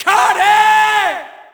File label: Shout, audience; UFC Tapout 2